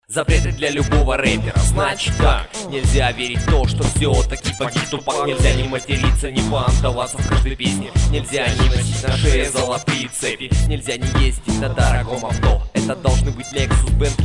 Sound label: jazz